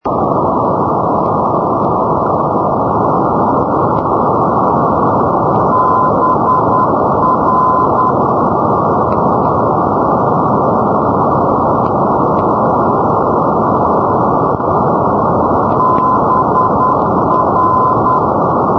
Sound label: ndb budapest; poellau